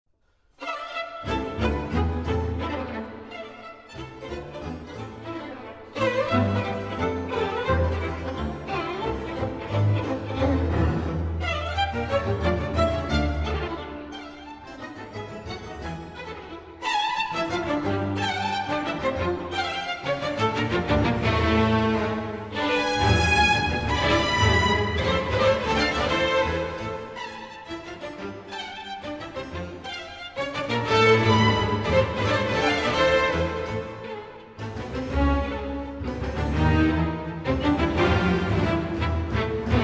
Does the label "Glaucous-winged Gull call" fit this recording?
No